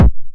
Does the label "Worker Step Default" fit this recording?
No